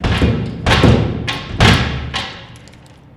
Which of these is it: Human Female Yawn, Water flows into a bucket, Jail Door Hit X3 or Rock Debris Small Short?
Jail Door Hit X3